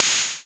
Tamed Urban Open Hat C# Key, Royality free open hat tuned to the C# note. Loudest frequency: 4793H